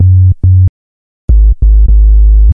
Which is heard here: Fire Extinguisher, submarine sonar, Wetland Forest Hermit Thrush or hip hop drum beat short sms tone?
hip hop drum beat short sms tone